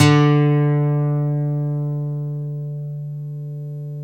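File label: GTR D3 AC F; Synclavier Sampler Library; KEYS AND GUITARS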